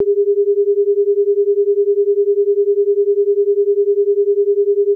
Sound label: 400+410hz